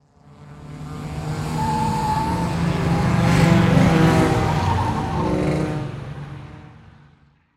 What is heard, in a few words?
Transportation Car Race Car Drive By On Turn Squeal Lots Of Cars